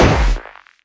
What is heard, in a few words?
gun tikka t3 battue 308 Stereo Shot; Heavily Edited